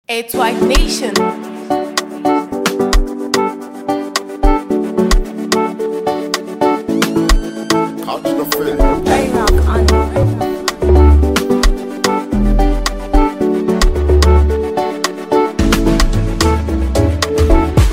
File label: Free Beatz No Noise Instrumental